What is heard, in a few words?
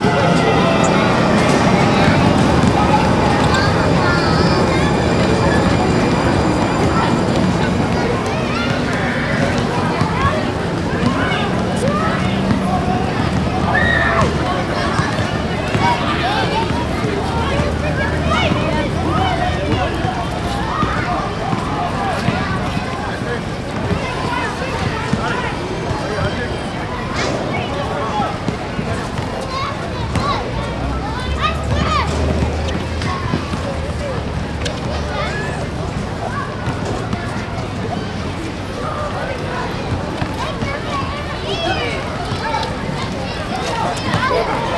Park Kids Playing Basketball; Moody; Houston